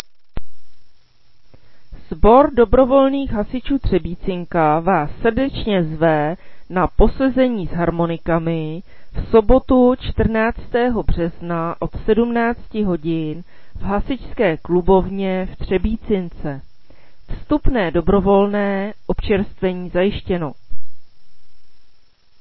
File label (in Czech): harmoniky; Třebýcinka